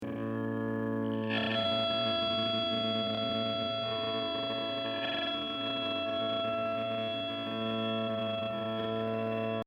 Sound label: mainriff; edge delay